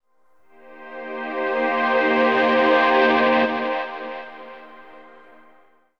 synth pad ambient 160 C; Sphere

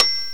metronome bell; gmplay; drums

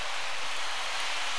Doors Crowd

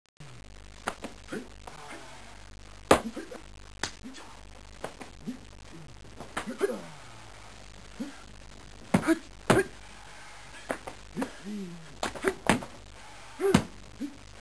Karate Sparring Match; Soundsation

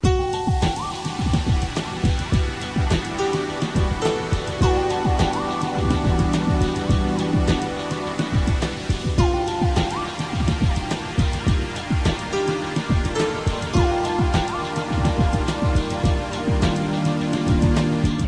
MUSICA

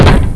crash; Nuclear Hammer

